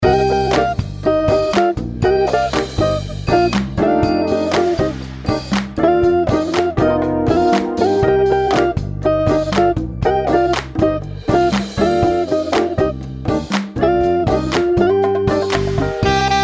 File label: chillout beach club